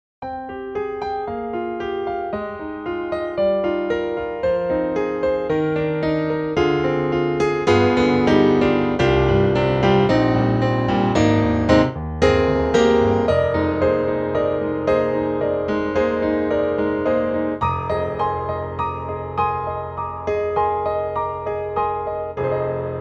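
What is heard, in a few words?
CFX CONCERT GRAND, piano; GARRITAN; CutnRun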